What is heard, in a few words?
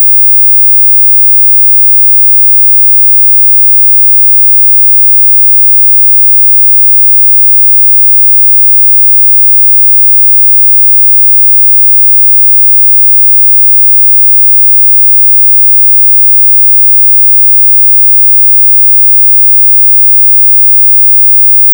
20kHz tone